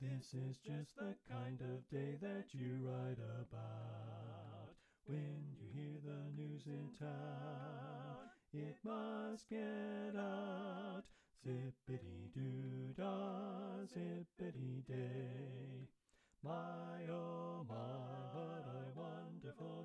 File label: Bass Left; Pennsylvania Groups; NBC